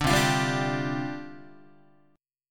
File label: C Suspended 2nd Suspended 4th, down, Guitar